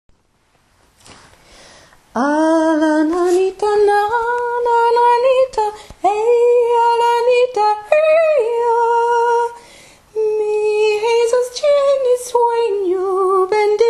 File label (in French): soprane; a la nanita